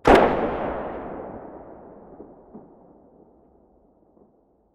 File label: muzzle mono far, weapons